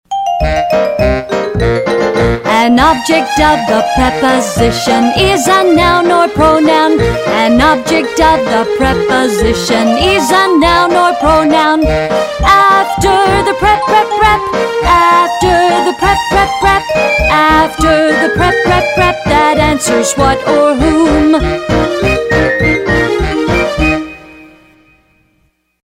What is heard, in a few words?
Jingle